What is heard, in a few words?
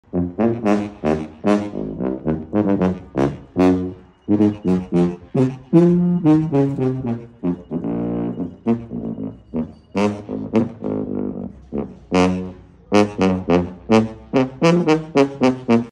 Sousaphone